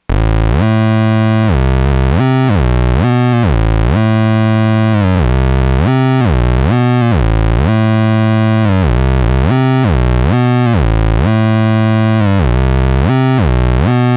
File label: octavius squeezer square, bass